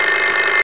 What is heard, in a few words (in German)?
rasseln